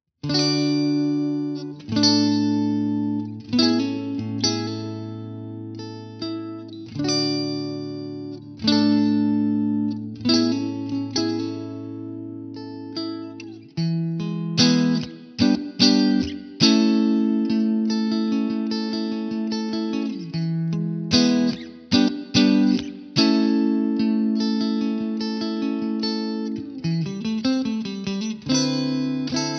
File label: clean; jensentone